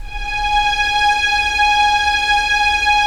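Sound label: STR String Section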